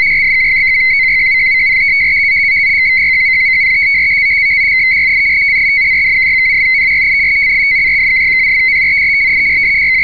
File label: PTOR FEC; Ham Radio 2000; PACKET